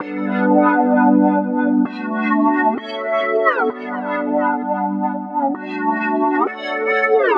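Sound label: 130 G major Synth Auto Wah; Zero-G - Future Wave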